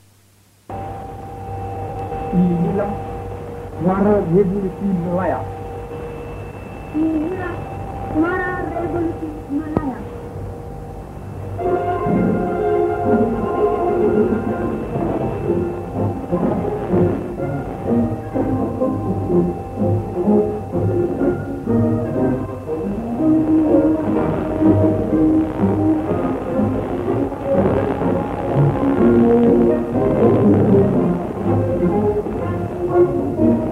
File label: 15790 kHz; mid 70s; CLAN-V of the Malayan Revolution